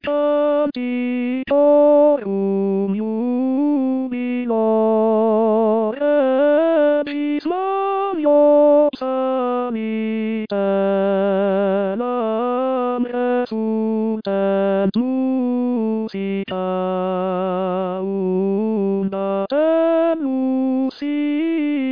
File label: Tenor; Canticorum Jubilo; Haendel